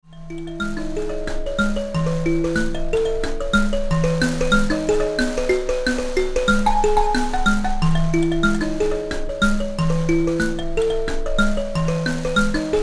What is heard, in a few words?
mbira